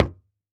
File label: Foley Footstep Golf Cleats Jump On Hollow Wood Surface Single; Foley Footsteps